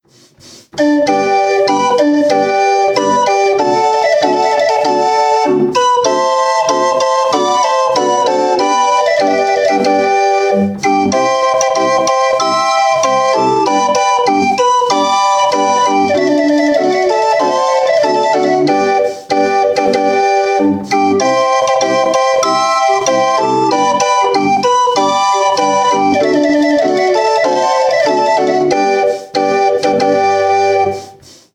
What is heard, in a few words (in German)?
orgel, Drehorgel; fruehtau